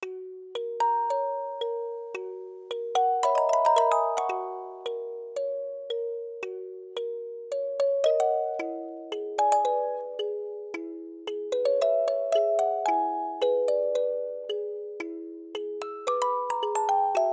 Music Box

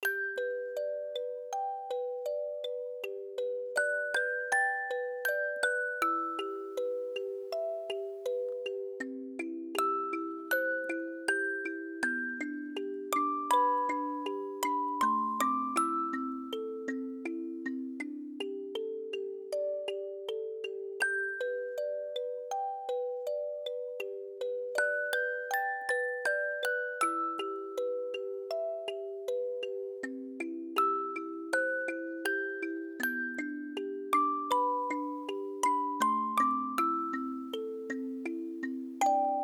Music Box

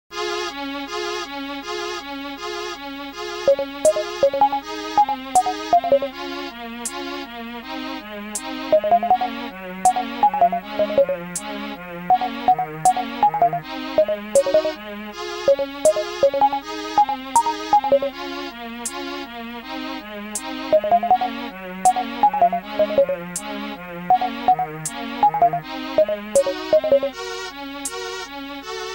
Ballad (Aircheck)

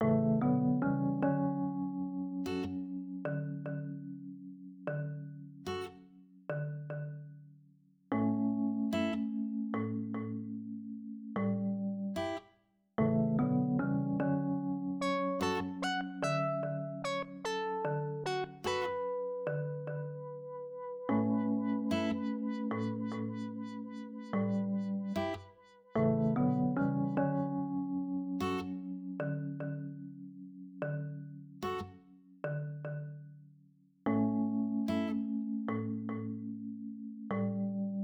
7 PM, Rainy

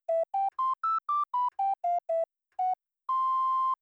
Default Town Tune

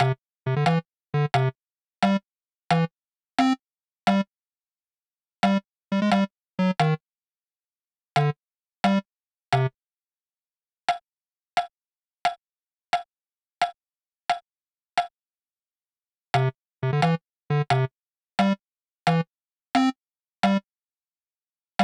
Aircheck, Retro

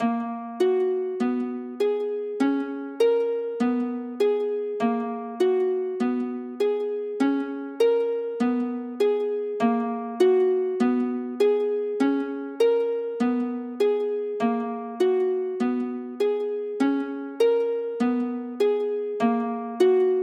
Museum (Insects)

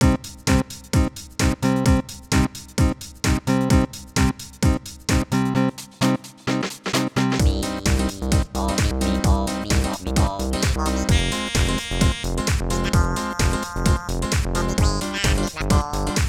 Tango House Remix

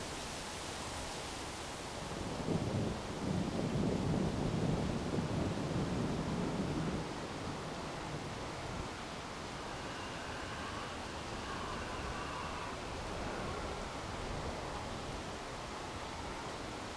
Storm Soundscape

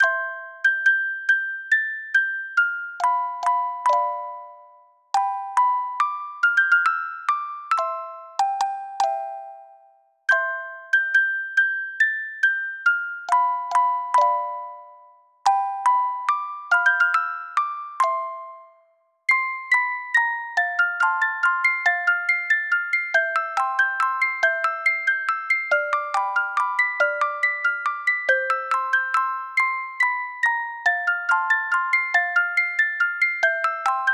Music Box

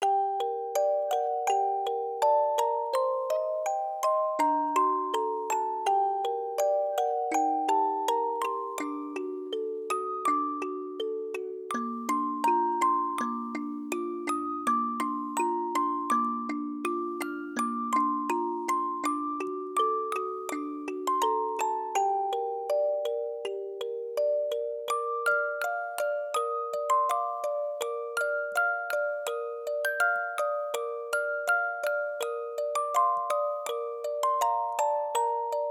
Music Box

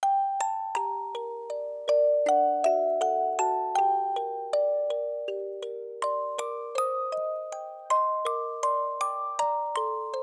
Music Box

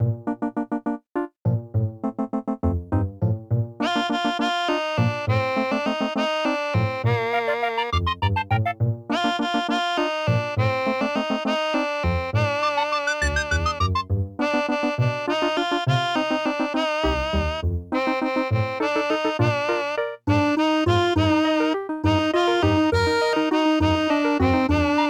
Aircheck, Retro

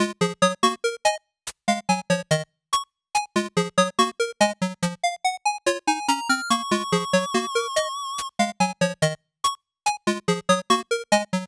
Brake Tapper

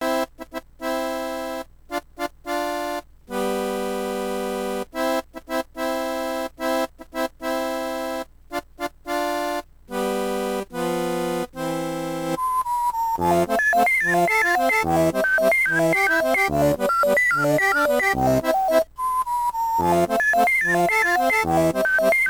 Moutain Song Aircheck

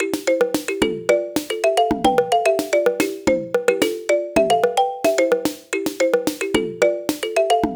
quest clear

